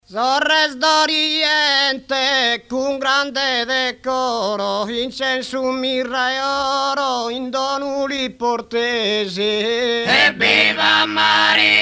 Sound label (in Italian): polifonia a cuncordu, canto a tenore; archivio rai sardegna; musica strumentale